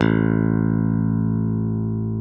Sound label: SB PICK A0, SPECTOR BASS; Northstar - New Gold VOL-1